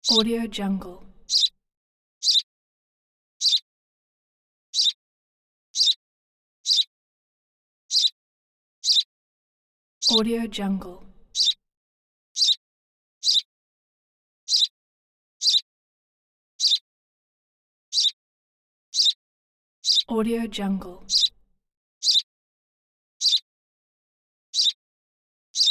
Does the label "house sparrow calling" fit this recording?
Yes